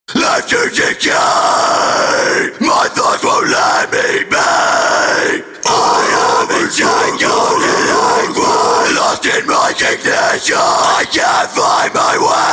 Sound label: vocals